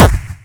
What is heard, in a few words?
kick hardstyle